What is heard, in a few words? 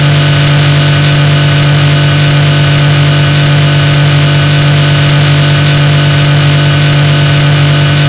engine; riceracer